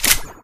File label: toggle; vaccinator; tf